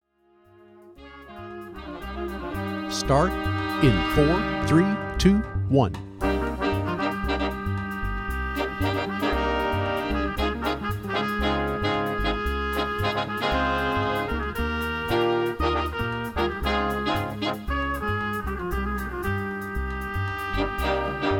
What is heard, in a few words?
sambamorphosis piano